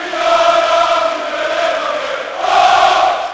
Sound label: Chant, Crowd; David Beckham Soccer; XBOX